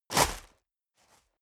step boots gravel medium jump; schritte

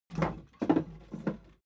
wood board handle roomy; objekte; holz

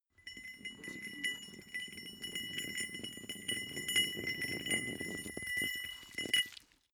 small metal rolling; industrie wirtschaft; metall

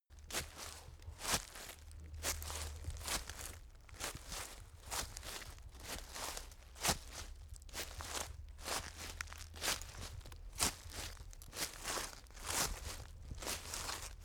step leaves walk

